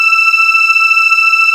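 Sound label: VOX E5, CHOIR; TOMVOX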